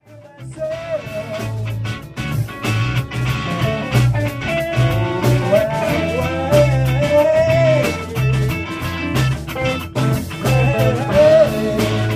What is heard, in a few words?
Improvisation